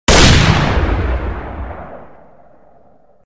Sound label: gun